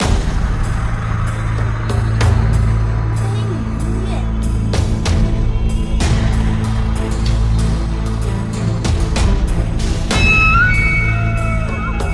远古战场

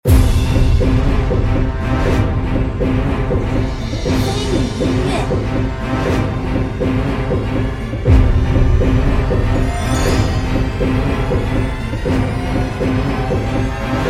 战斗 紧张 激烈

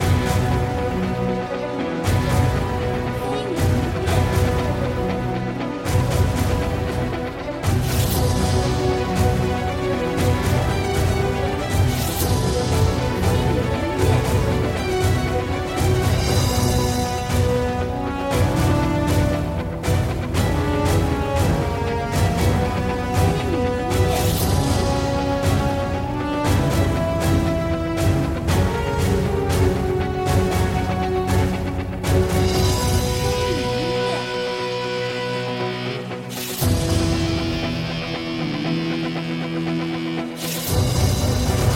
主界面背景音乐

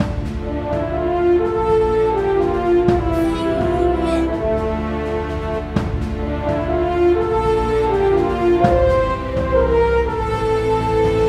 登录

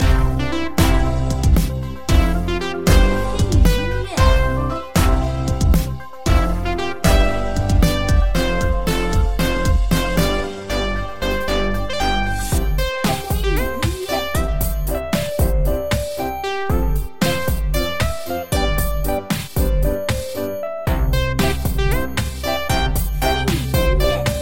登录

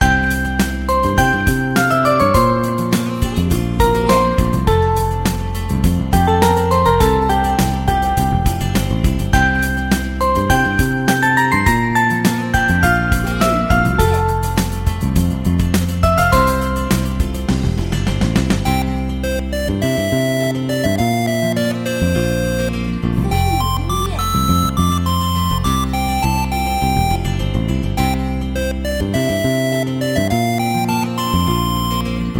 城镇音乐

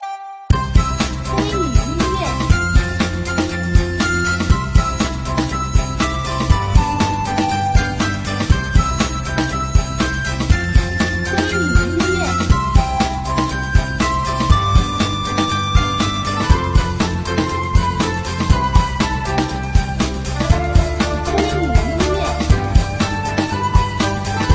轻松-欢快-节奏

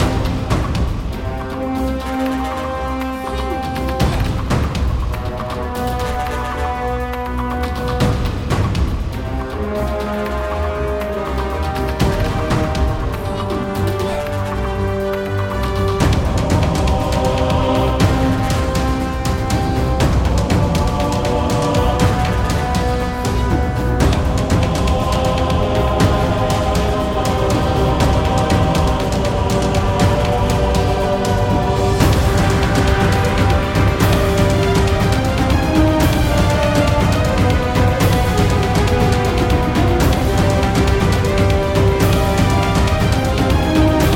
战场音乐

